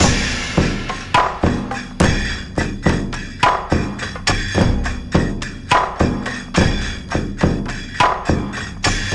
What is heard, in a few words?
TYPE LOOP